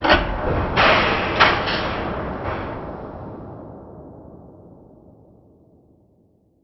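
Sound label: industrial ambience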